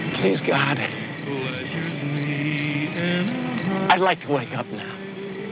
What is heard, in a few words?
wakeup